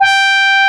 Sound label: FM FLUGEL G4, HORNS; AKAI S-Series CD-ROM Sound Library VOL-2